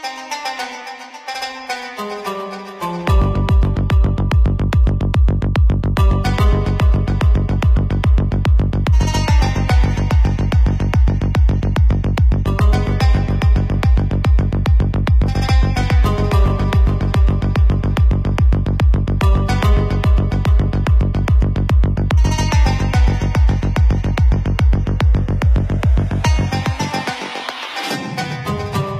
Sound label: Trance